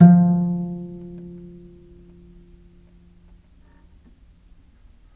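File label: VIOLE PIZZICATO F2, Historical Instruments; USB Soundscan vol.23; AKAI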